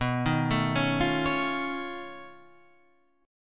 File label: B 9th Flat 5th B down Guitar Standard; B9b5; arpeggiated; JGuitar